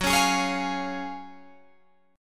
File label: Gb Major 7th Suspended 2nd, down, Guitar, Drop D